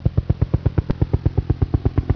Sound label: HELI, sound effect Flyby